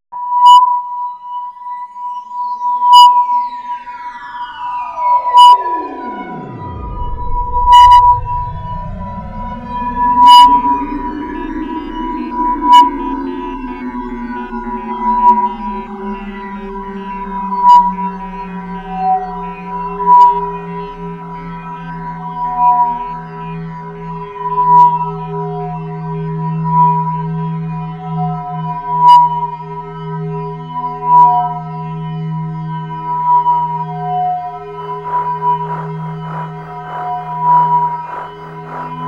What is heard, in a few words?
Sine Wave